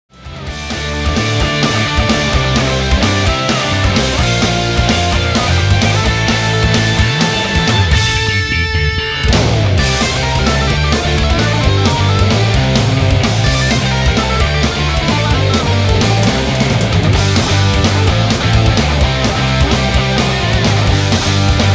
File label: Instrumental